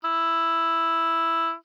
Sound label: Oboe ff E4 stereo; Woodwinds